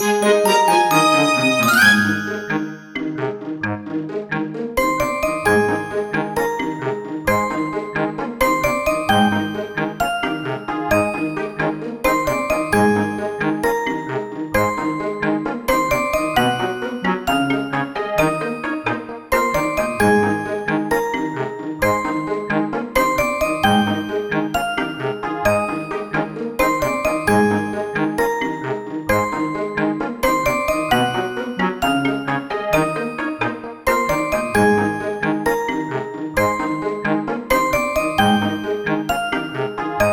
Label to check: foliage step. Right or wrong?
Wrong